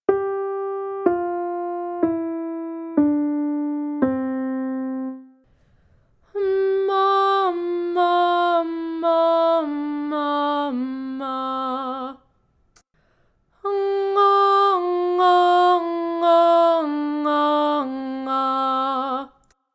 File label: Vocal Exercise